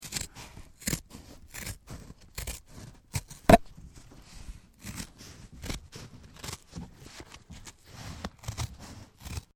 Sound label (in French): decouper Peau